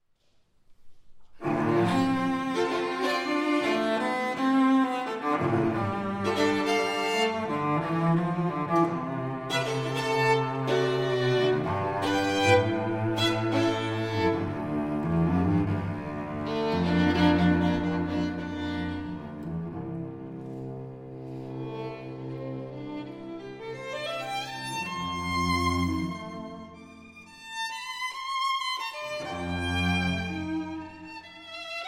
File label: duo for violin and cello allegro serioso non troppo